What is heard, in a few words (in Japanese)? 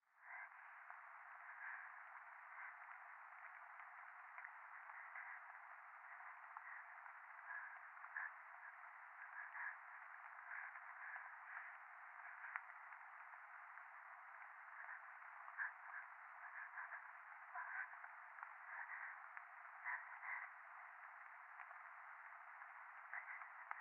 右耳快感吐息 雨